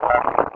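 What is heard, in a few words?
droid sentry; Star Wars Knights Of The Old Republic I; XBOX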